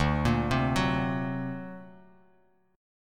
D Augmented 7th D down; D+7 arpeggiated; JGuitar

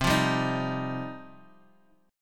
F 7th Suspended 2nd C

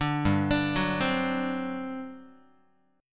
G Major 7th Suspended 4th, G, down, Guitar; GM7sus4; arpeggiated

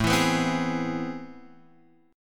A Minor Major 9th, down, Guitar, Open F; strummed; JGuitar

